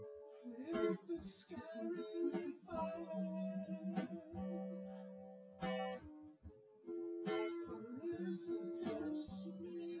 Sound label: no drums synth conv 250ms 5cm sim; SiSEC08; SiSEC underdetermined